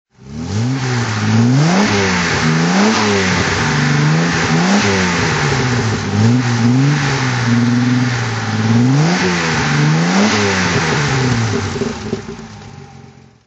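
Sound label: jaguar etype 42 s15 ots throttle, Engine sound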